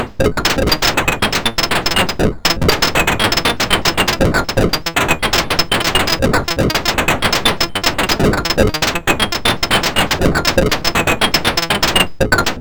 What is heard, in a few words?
click and crank